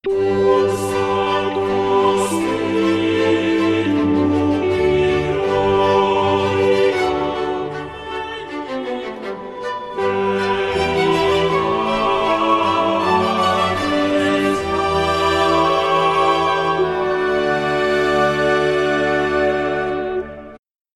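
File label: Cum sancto spiritu (andante) (alto), VirtualVoice; Stage Zelenka 2023; zelenka zwv21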